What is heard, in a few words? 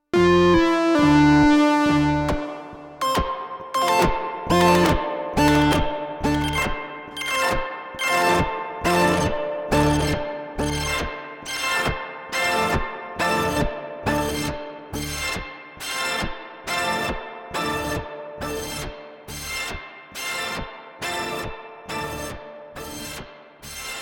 Strymon Magneto Shift Tape Control w Step Sequencer Normal Speed